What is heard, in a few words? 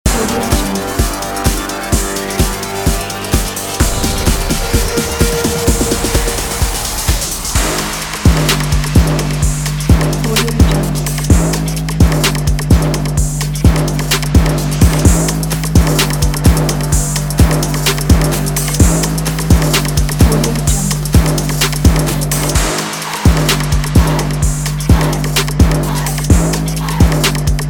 power trap